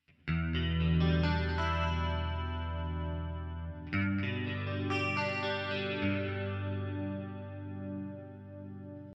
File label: clean